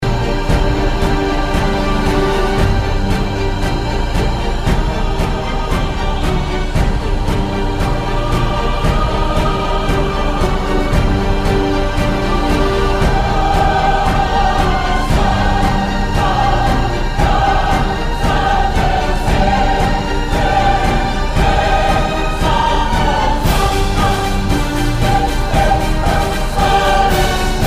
激情的背景音乐

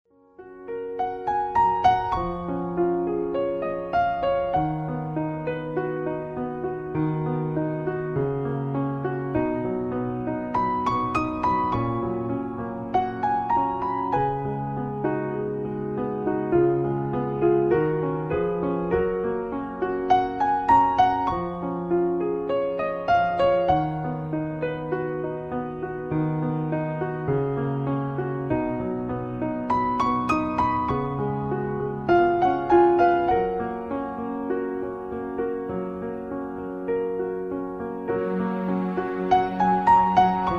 彩铃背景音乐

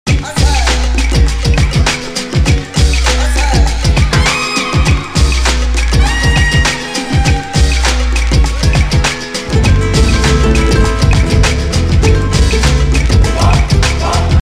片头音乐

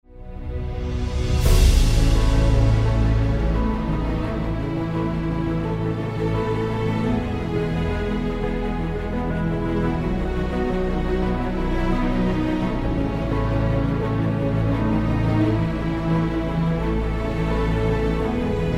专题片背景音乐

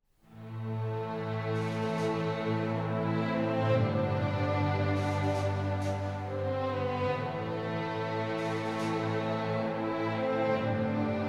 伤感的背景音乐; 3分以内

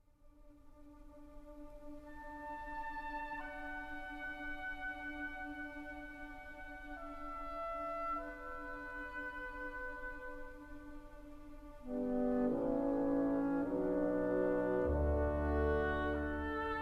深沉的背景音乐

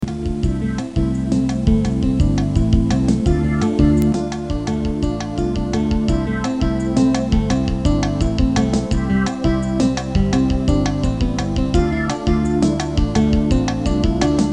片头音乐

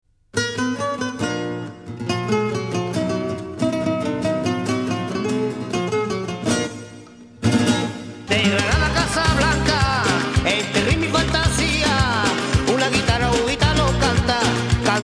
电视包装音乐

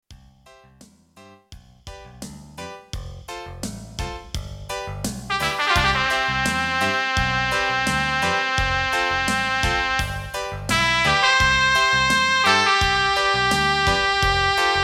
片头音乐

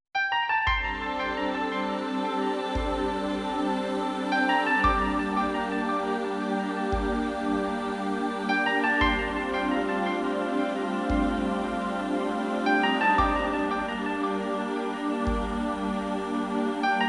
纯音乐背景音乐

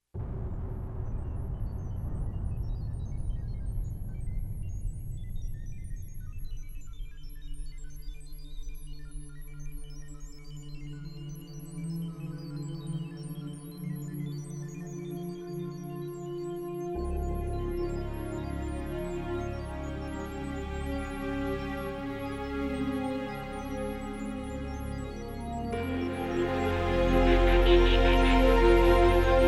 宣传片背景音乐

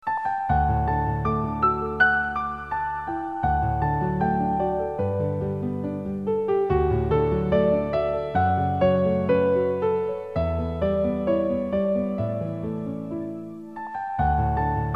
轻柔的背景音乐

